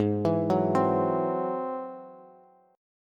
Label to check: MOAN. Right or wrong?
Wrong